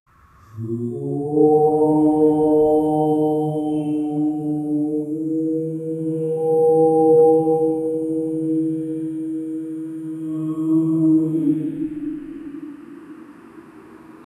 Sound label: a long and deep whale